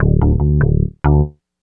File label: Bass, Synth Bass Loops; Sonic Foundry (Sony Creative Software); Groove Elements